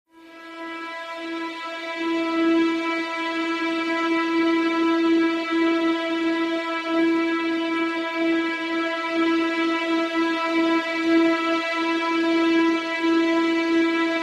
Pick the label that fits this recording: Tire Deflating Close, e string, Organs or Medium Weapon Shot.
e string